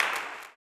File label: CLAP, Blues Soul; Spectrasonics Vocal Planet